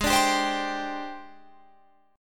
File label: Ab Major 7th Flat 5th Ab, down, Guitar, Drop D